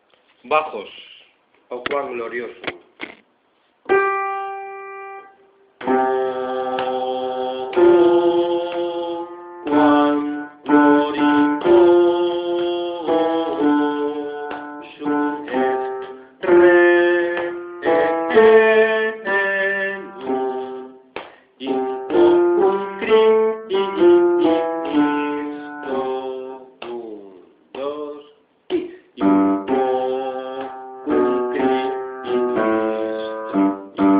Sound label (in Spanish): bajo